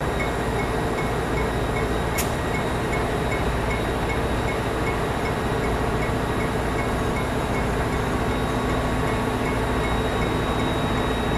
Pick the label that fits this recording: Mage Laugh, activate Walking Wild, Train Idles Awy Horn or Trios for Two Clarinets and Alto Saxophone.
Train Idles Awy Horn